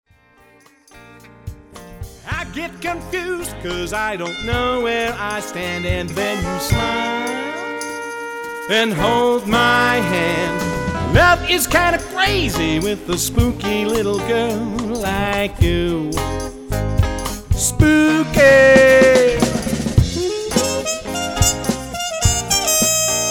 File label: Spooky